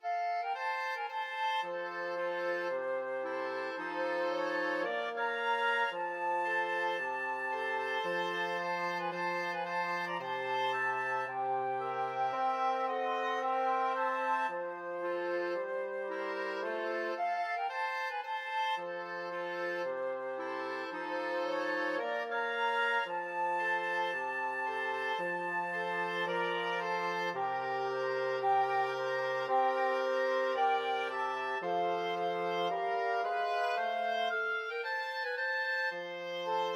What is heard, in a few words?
flexible mixed ensemble, 4 players